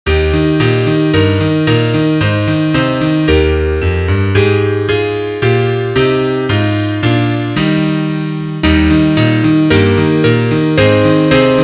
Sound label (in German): Klavier; morgen kommt der weihnachtsmann